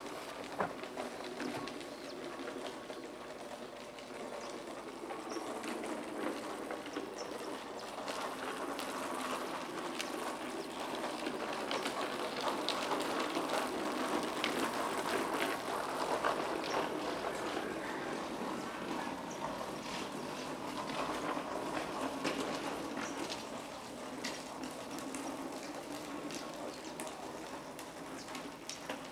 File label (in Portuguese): Rodas de carrinho de bebe no asfalto; Cerrado; equipamentos